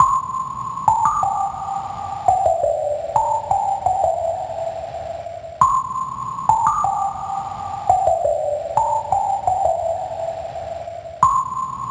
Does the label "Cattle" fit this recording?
No